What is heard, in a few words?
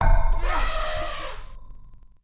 Build War Elephant; Civ4 warlords